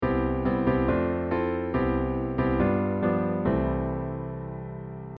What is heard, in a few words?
Closed Voicing Sample